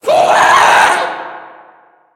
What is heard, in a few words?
scream; minecraft; mob; ghast